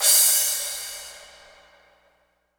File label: IMP CYM CRASH, Cinematic Impact; M-Audio Pro Sessions; Liquid Cinema; Drum